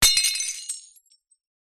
impacts plate dish ceramic drop ground smash break